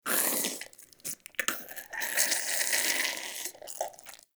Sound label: Wheeze Close